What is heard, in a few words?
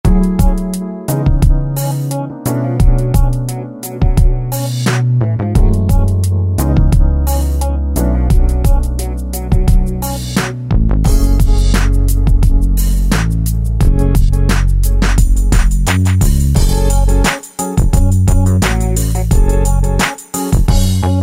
Funky, Yamaha DJX Styles